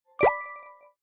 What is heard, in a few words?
complete mission; tekton